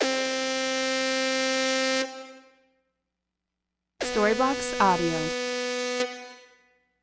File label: Ambient House Sax 120 BPM C